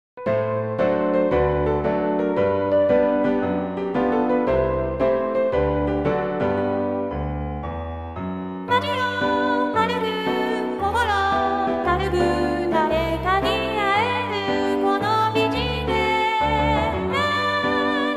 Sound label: chor-s